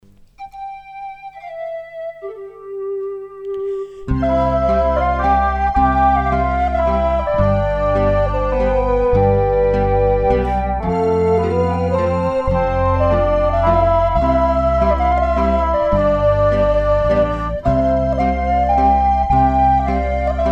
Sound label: Instrumental; Abiglied; RitterLieder